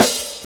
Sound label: SD OHAT, snares; E-Lab; X Static Goldmine 2